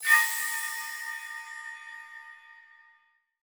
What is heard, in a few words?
new rank; CrossCode; battle